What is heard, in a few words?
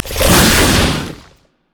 spider attack; LoL